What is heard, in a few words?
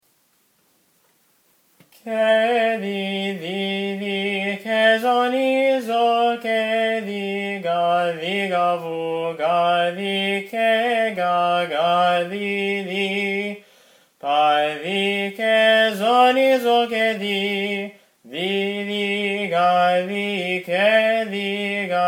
Glory Both now (Apolytikia) - Tone 1, byzantine chant